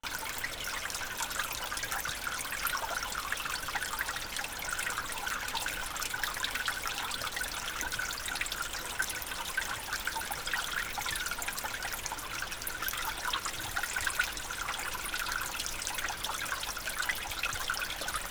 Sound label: Trickle; Chalice Well